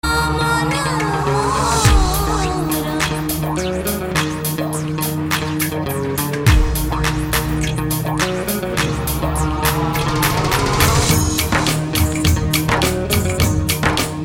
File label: Dhol Mixx